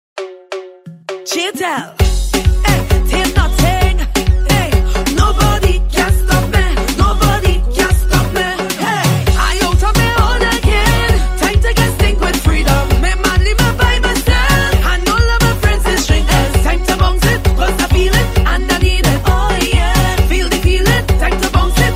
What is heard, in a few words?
soca